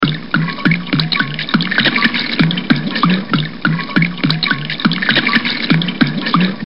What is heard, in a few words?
drink; Three Stooges